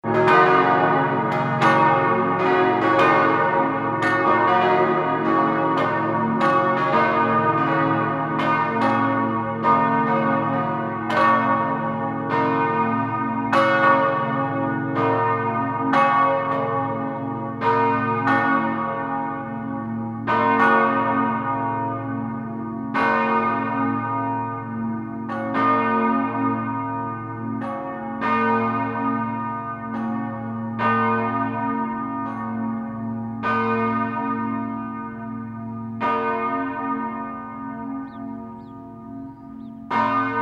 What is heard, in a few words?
monastery bell dieing away